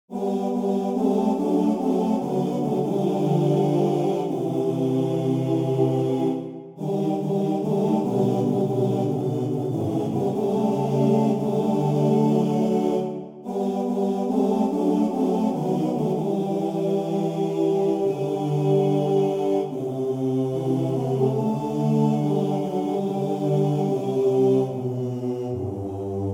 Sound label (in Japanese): dansei midi